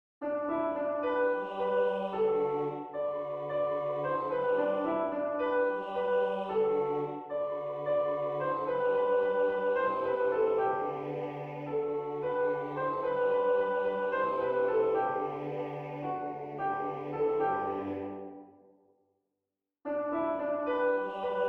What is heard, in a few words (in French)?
soprano tutti